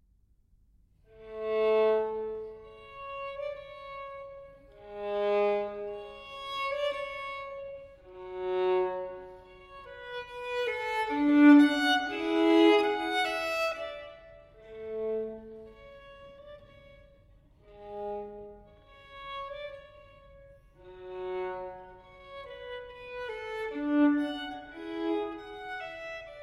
Solo Violin in B-Flat Major Largo; No. 1; TWV 4014; Telemann Fantasia

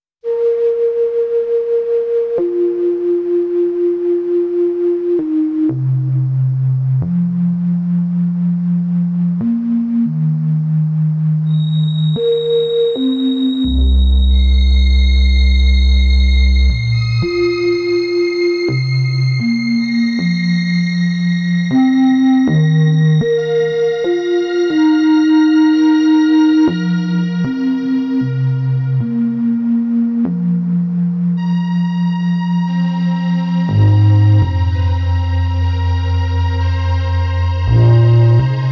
Flute

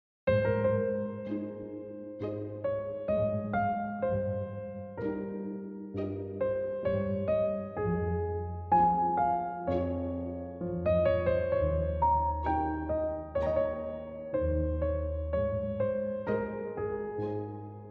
Harpsichord Concerto in F Minor, Largo; No. 5; BWV 1056

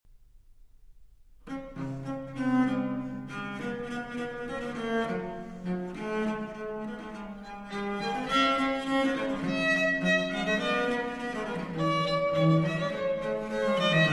Trio Sonata in D Minor, Allegro ma non tropo

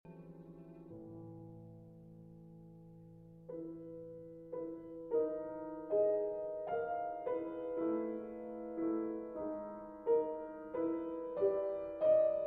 Sonata for Violin and Piano, Comodo

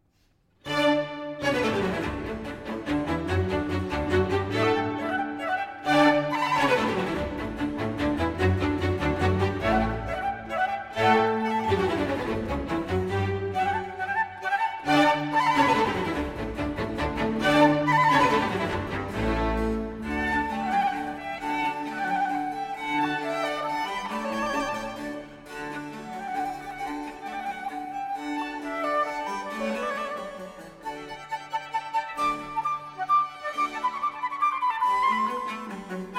Flute Concerto in D Major, Allegro; Vivaldi Flute Concerto in D Major, Op. 10 No. 3, RV 428 Il Gardellino; III. Allegro

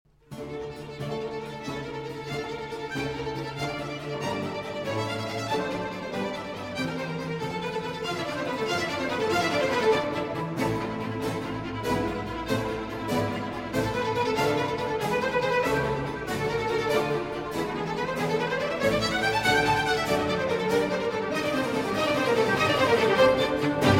Concerto for Strings and Continuo in D minor

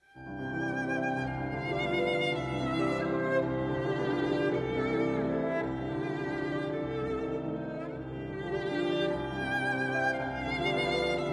Piano Trio, Allegro con Moto

